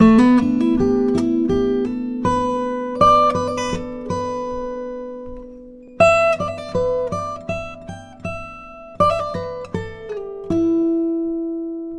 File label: acoustic guitar; sonic excursions for acoustic guitar; acid wav; magnetrixx